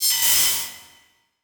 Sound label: Transition Feedback Electronic; Production Element